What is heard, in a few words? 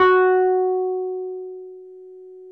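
Wurlitzer electric piano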